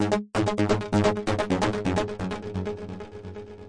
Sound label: gentle plucky mystery synth; SYNTH AND ELECTRONIC LOOPS